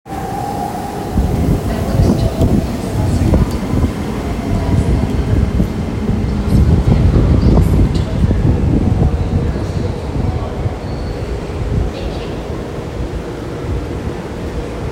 京急本線京急蒲田北通過予告

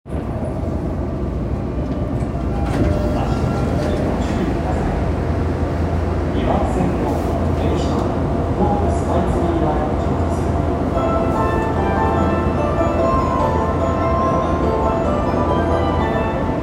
日比谷線三ノ輪北, train sounds

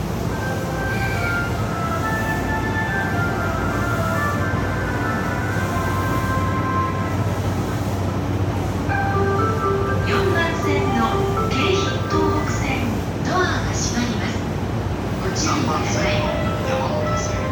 京浜東北線田端南, train sounds

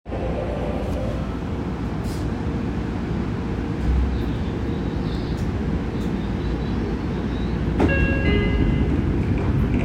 池袋線練馬東, train sounds; 西武

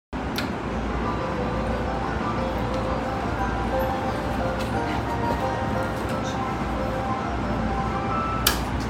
京浜東北線根岸北, train sounds

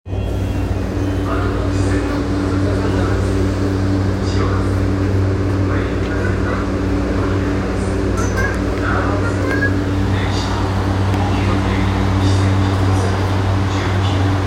半蔵門線大手町西

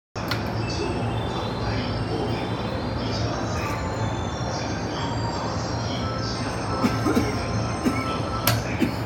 京浜東北線東神奈川南, train sounds

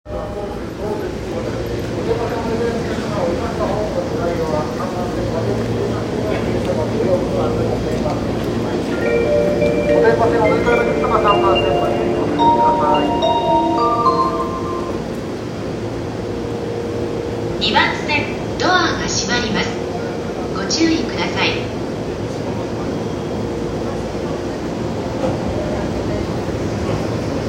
JR東海道線国府津南, train sounds

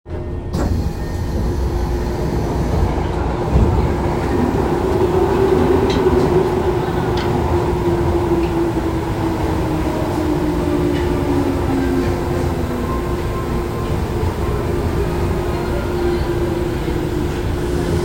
東西線高田馬場西, train sounds

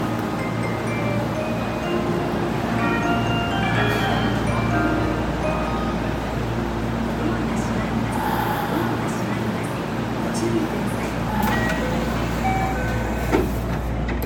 天王寺発車, train sounds

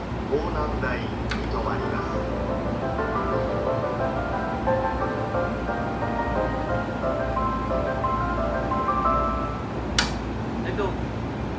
JR 根岸線 洋光台 北, train sounds

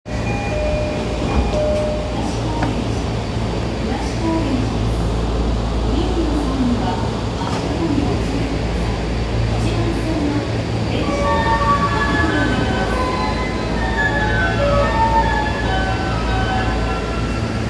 丸ノ内線東高円寺西, train sounds

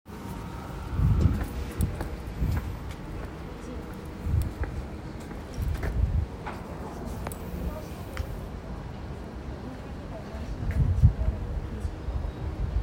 小田急線狛江西接近

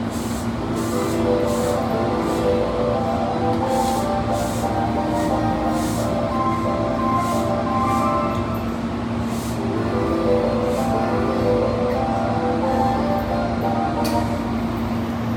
京浜東北線上野北, train sounds

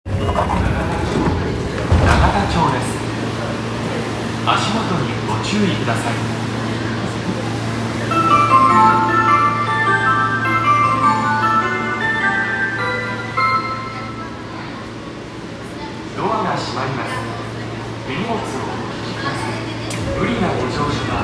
有楽町線永田町西, train sounds

